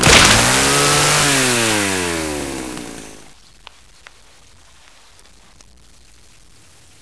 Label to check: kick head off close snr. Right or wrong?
Wrong